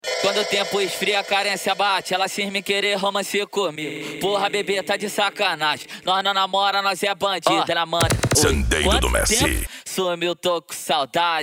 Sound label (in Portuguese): funk mega bass